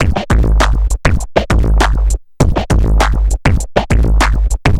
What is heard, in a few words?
drloop, drumloops, Vinyl Attack; Masterbits